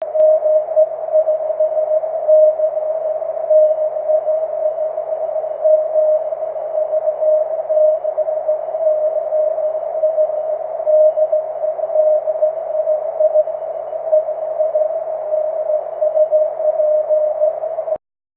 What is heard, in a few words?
cw, 23cm